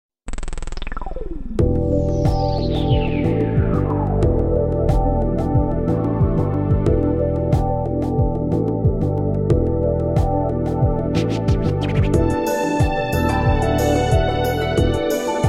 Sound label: mail; xenosaga